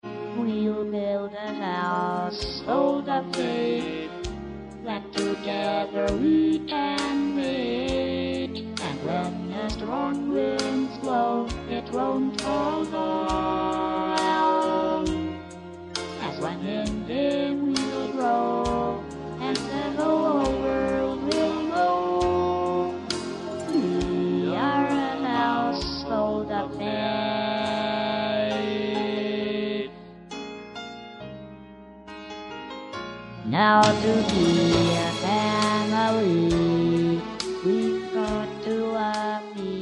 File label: DUET VERSION